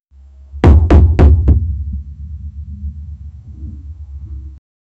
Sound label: the sound of banging and